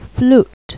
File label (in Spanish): FLAUTA; Pipo-Aprende musica con Pipo